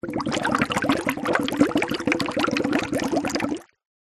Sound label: nature water bubbles small shallow water plastic straw container short; zapsplat